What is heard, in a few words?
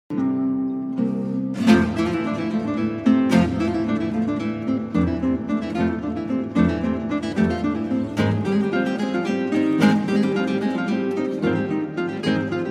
Guitar Quartet; SanteFe